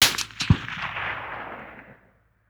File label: soundscape gun far